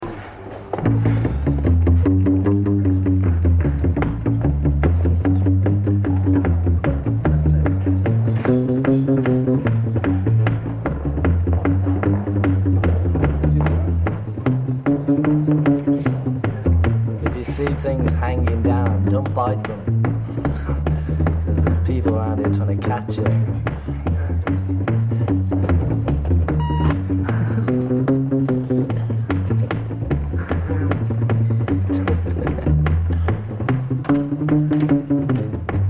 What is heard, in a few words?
Instrumental